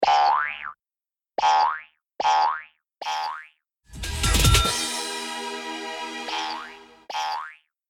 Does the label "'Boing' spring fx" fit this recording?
Yes